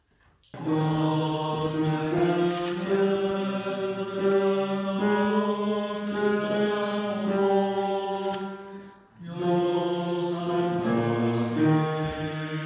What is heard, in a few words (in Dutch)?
Bas; El Nacimiento